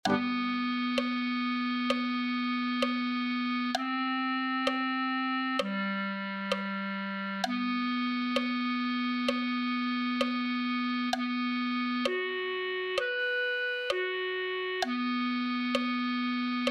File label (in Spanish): clarinete